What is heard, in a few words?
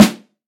snare drum sound a key, acoustic snare sample tuned to the A note